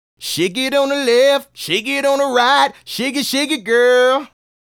Male Solo, Hip-Hop and RnB Vocals

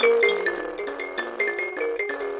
Buzz Kalimba; Roland LCDP11 Africa VOL-1

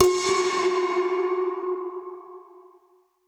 Perc Hit, dub percussion

